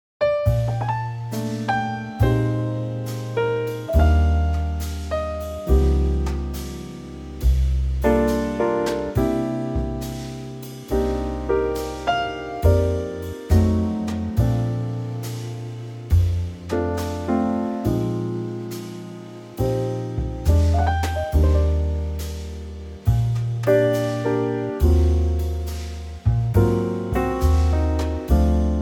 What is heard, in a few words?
Bb Trio; key - Bb - vocal range - Bb to A